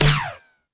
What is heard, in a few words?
BOMB; XBOX; Crash Bandicoot The Wrath Of Cortex